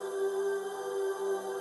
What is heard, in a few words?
Wheel Loop; Diamond Empire